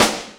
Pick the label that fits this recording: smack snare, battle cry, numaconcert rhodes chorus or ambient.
smack snare